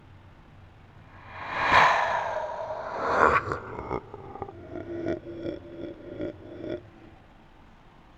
Monster

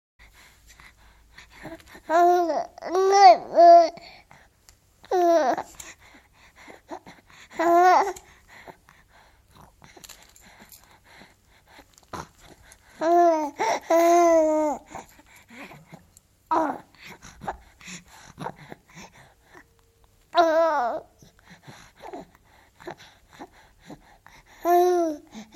Baby

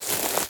Footstep Grass

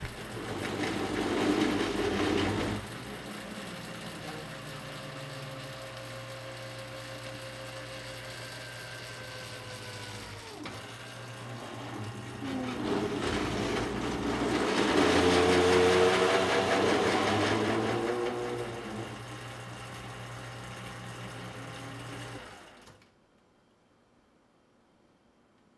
Trash Compactor

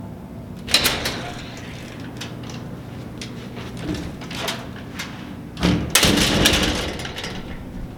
Heavy Metal Door Medium

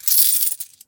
Coins

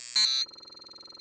Scan Effect

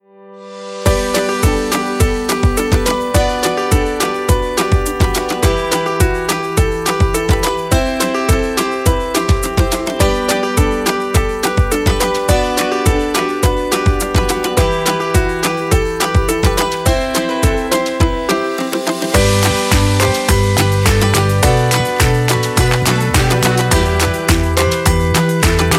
Happy Music

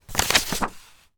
Handle Paper

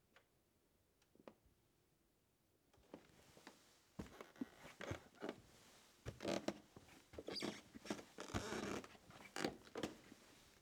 Stairs

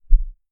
Single Hearbeat Deep Bass Kick

